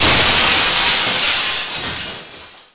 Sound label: Glass Big; TrickStyle